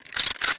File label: Equip; DungeonShooting; Godot; 完善音效